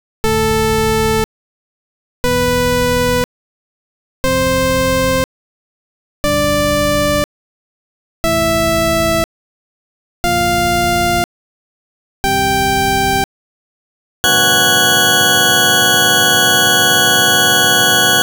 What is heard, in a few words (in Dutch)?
toonladder, synth